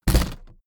suitcase put down hard

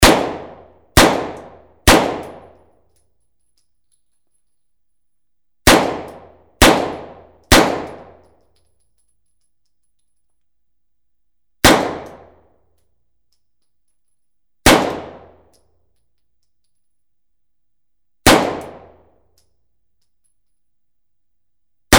silenced MP5 shots

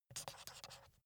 marker pen write paper short

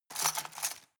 cutlery plastic multiple drawer grab hard

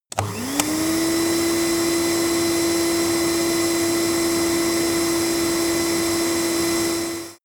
vacuum close turn on

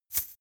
chain mail impact very soft

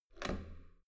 metal door heavy handle up

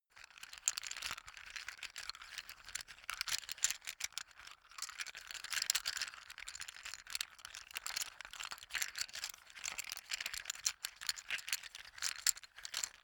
poker chips handle sequence

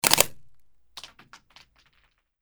silenced MP5 3 shot burst

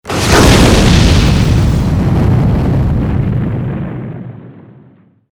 war zone big explosion

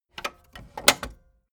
tape deck close clatter

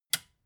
metal button release power off

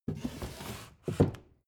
book shelve

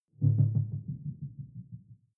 sci-fi critical bell deep

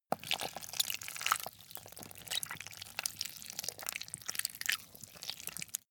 intestines handle

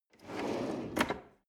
drawer medium close draw short

